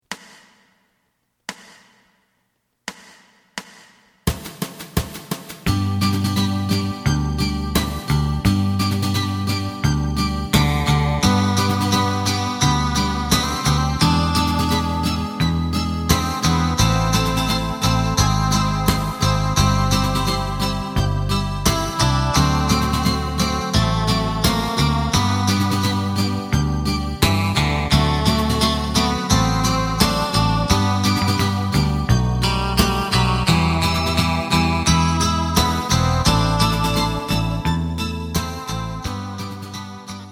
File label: drum